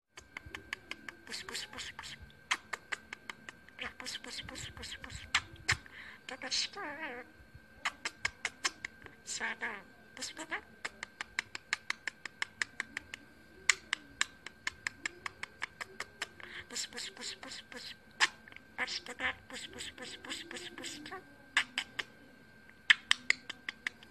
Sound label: speaker sound budgie